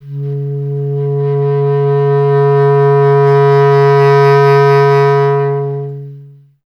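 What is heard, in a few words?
wind bass clarinet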